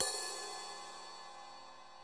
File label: RIDE